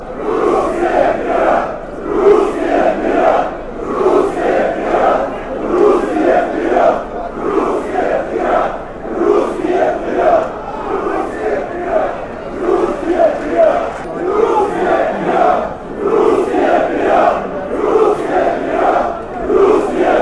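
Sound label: come russians, football chants; Простая кричалка на английском языке, исполняемая на каждом матче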